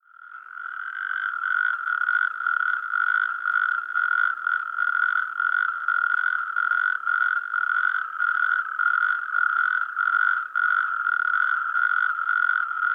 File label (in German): Kreuzkroete Bufo calamita